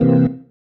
Stab One Shots, Organ

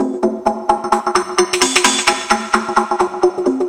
House

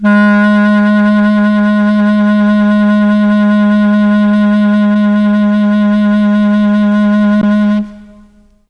CLARINET G#2, Mellotron; Mike Pinder - Mellotron Archive CD; CHM CLARINET

